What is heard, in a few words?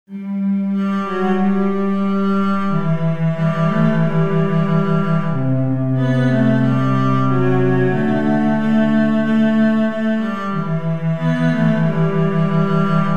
stella strings hoerbeispiel